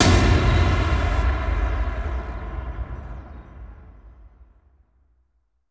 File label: SciFi; 99Sounds; Rumore Cinematic Impacts